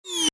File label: Menu SFX: Go Back; Buttons; Multimedia; Stuart Duffield